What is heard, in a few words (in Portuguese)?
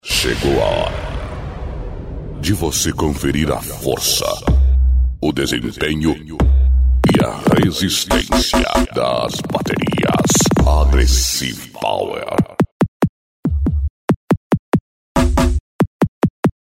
Baterias, som automotivo; Baterias Agressive Power; som automotivo raiz; Open Format